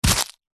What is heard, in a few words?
plastic packet cellophane containing small object grab pick up